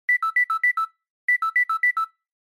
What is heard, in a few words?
Feature Phone Alarm